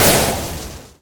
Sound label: chain frost impact; goo goo gaga boss